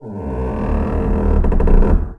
Maximizar

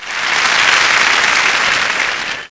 aplauso; Computer 2000

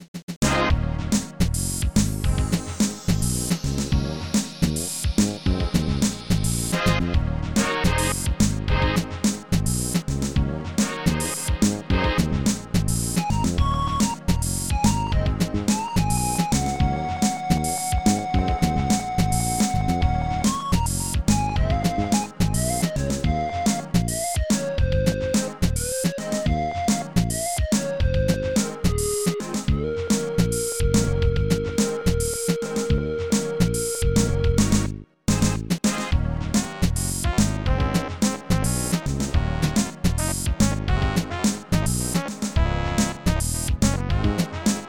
música; dragon ball

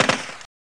DISPARO; cyberaz; DISPARO SUR

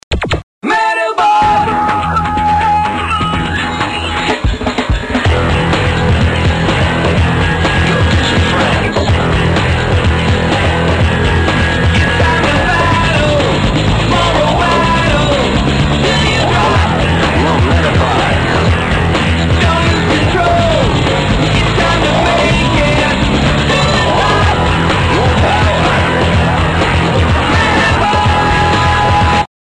Opening Japonés; Medabots

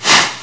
DISPARO OVNI; cyberaz

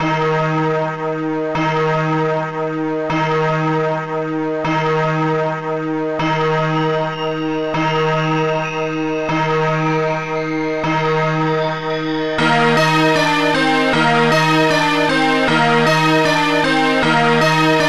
campanas

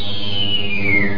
caída; PCMania; proteus

